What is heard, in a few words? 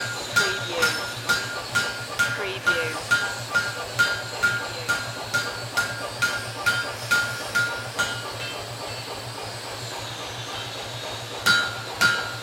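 Blacksmith Ambience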